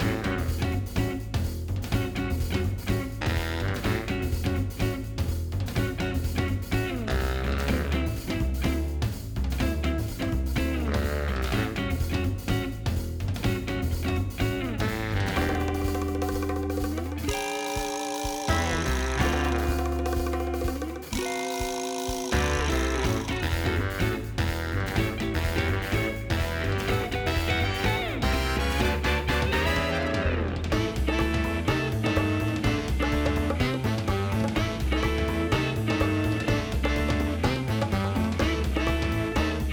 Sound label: Prison Groove